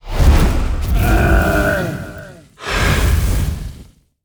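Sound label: boss death, monster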